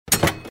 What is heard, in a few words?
tiger roll end